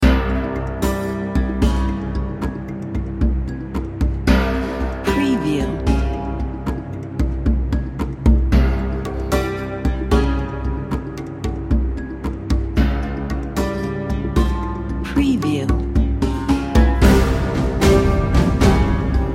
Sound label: threatening middle east